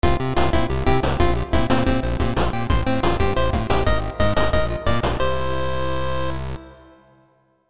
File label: Stage Clear